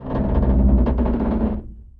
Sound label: Creak Large Metal Ship; SFX Kit